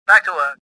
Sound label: confuse; worker1